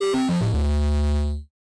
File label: Lose; MiSide